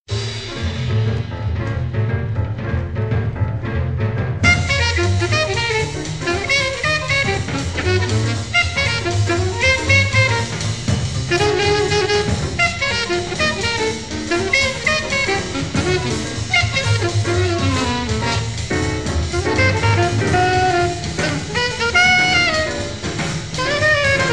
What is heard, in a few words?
drums; Drums Monsters